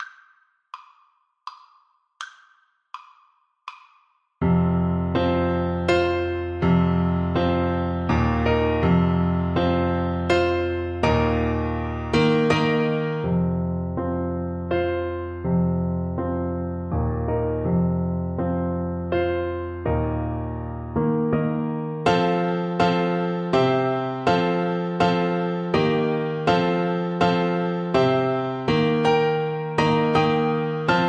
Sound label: blast of wind, Flute